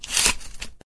shotgun load, weapons; svencoop

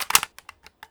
sniper mag in; svencoop; weapons

